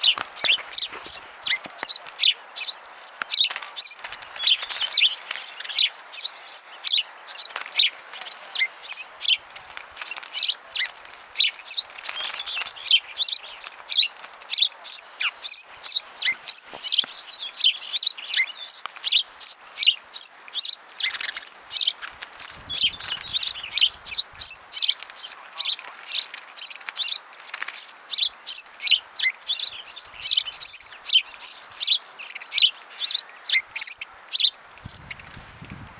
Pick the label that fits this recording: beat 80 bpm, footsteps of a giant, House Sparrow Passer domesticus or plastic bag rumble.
House Sparrow Passer domesticus